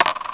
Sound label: coin drop; Sound Effects for Multimedia - Volume 1